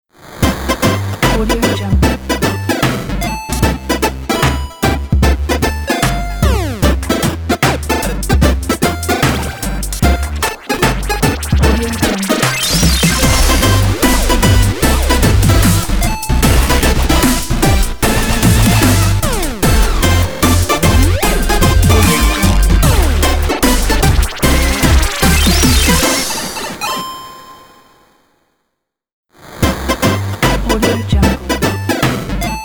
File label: 8 bit jingle bells intro